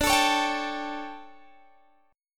D Major 7th Suspended 4th, Guitar, Half Step Down

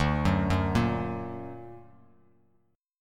D Augmented D down Bass Standard; D+ arpeggiated; JGuitar

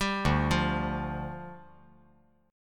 D Minor Double Flat 5th, D, down

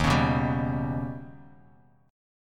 Eb Augmented 7th, Bass Standard; Eb+7 strummed; 11,16,9,12; JGuitar

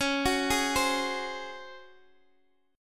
Db Major 7th Suspended 4th

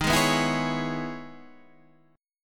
E Major 13th chord

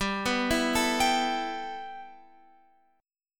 G 6th Add 9th, Guitar, Open F; G6add9 arpeggiated; x,14,11,11,12,14

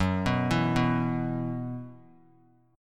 F sharp 7th Suspended 4th; F#7sus4 arpeggiated

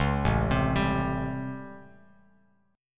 A 7th Sharp 9th, Bass Standard; A7#9; arpeggiated; JGuitar

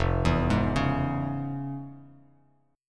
F Minor Major 7th Sharp 5th, F, Bass Standard; arpeggiated; JGuitar

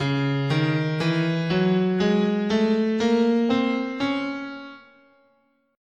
Db Bebop Melodic Minor, scale

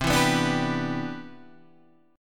C Major 9th, C, down, chord; strummed; CM9

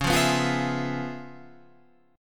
Db 7th Sharp 9th Db down Guitar Open F; Db7#9 strummed; JGuitar

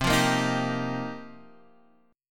F 7th Suspended 2nd Suspended 4th

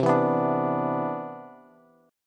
C Major 9th, Bass; strummed

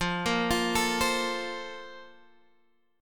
A sharp Major 7th Suspended 2nd

